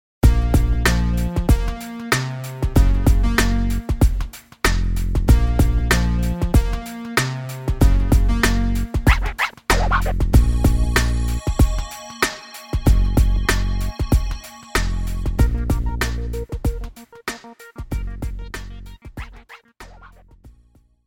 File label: beats flows